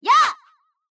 slash; Mystical Ninja Starring Goemon

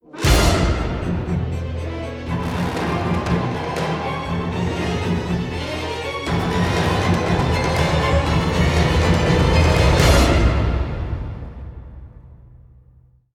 Cinematic Music - Angry Stinger

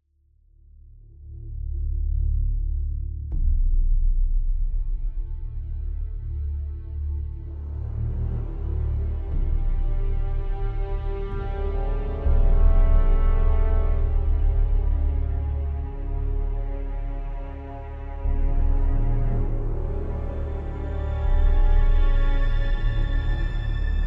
Loop Ominous

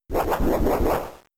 Meditate

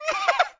Hihi; talking tom cat 2 android ios online gamerip 2011

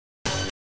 pickup tone

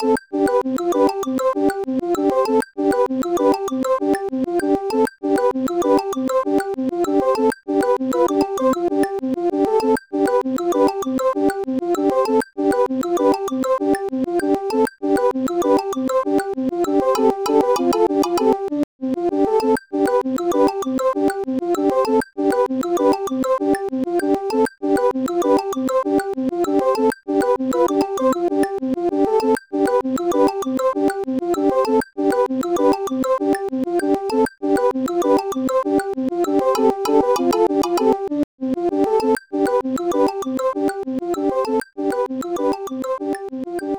Clock; Personal Trainer: Walking

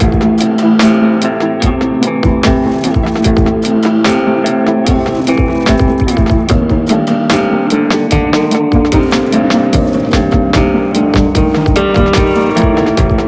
Ambience

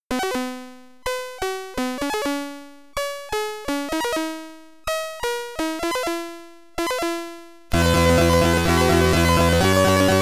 Title Screen, SID Stereo; Gemini Wing; Commodore 64

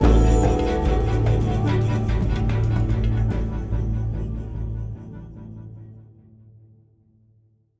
Sting Demons; the walking dead michonne

